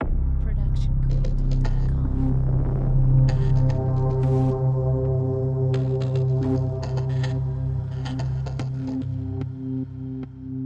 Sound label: Suspenseful Ident; soundscrate